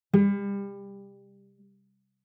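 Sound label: Cello pizz fortissimo sul D G4; MIS Pitches - 2014; Strings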